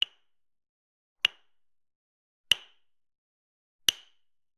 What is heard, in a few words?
Generic Click Wood Small; boom library; The Interface